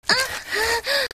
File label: Moan